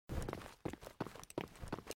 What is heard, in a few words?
Footsteps